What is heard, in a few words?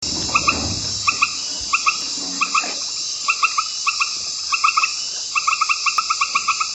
river, amazon; peru